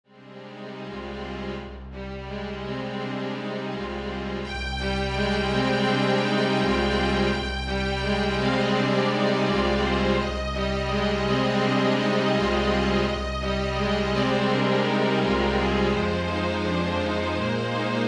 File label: Quartet; Latin Songs